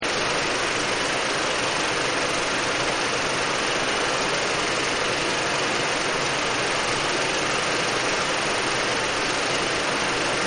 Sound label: Film Projector Booth; Projectors; Offices; Partners In Rhyme